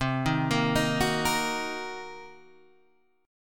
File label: C Minor 7th Flat 5th, Guitar, Standard; Cm7b5 arpeggiated; JGuitar